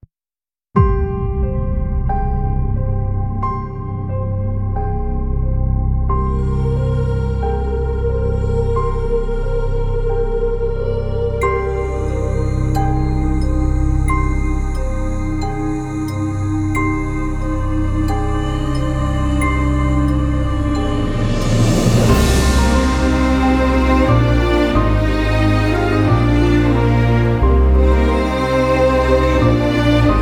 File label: Orchestral Version